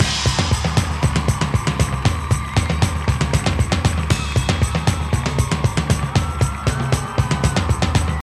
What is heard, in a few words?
respin background; riviera Star; Fantasma